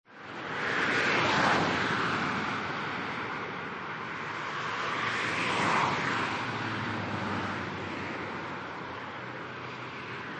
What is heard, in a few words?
Freeway Traffic, Smooth traffic moving at good speed on freeway, high; Cars driving by at speeds around 100 km/h; Traffic And Car